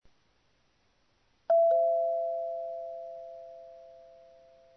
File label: Doorbell